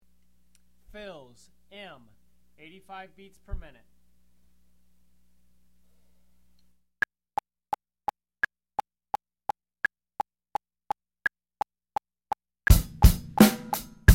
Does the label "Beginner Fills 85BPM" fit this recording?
Yes